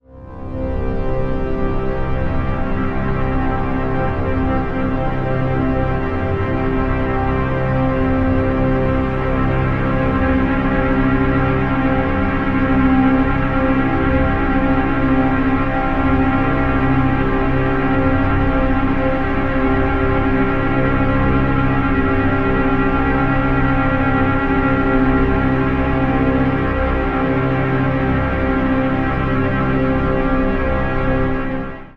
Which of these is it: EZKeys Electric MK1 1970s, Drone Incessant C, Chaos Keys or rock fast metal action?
Drone Incessant C